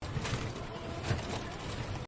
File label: impatient auto rickshaw